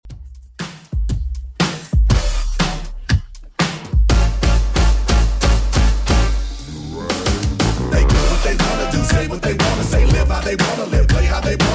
2ks Redrum 120bpm

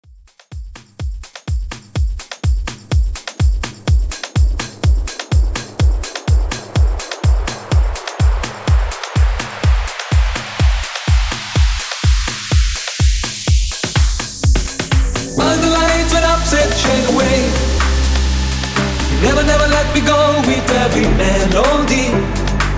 Club Bootleg 125Bpm; djyan

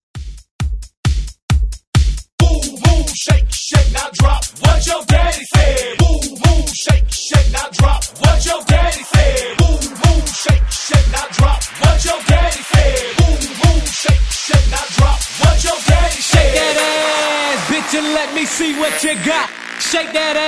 Break Party 133 Bpm; DJDOLLS

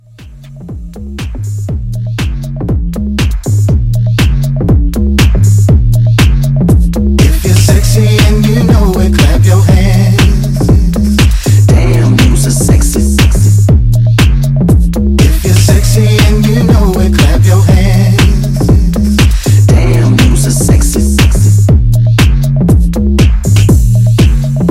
Hype Re Drum, 120

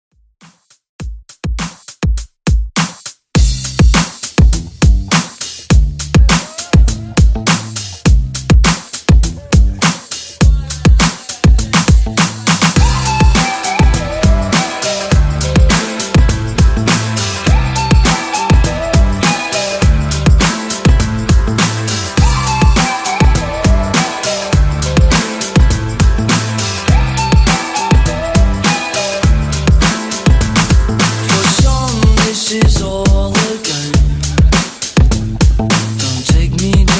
Re Drum Quick Intro

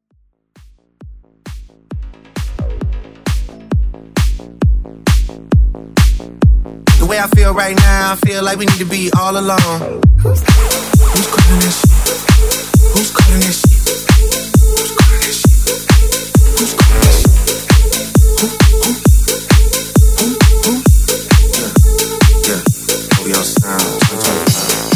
Nokia Phone Ringtone Blend

